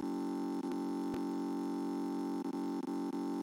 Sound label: shortest blue screen of death sound effect